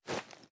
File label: reload fast arm, weapons; gmod; viper